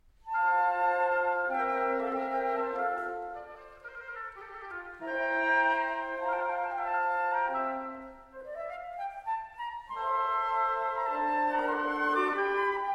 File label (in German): Andante fuer eine Walze in eine kleine Orgel F-Dur; W A MOZART; KV 616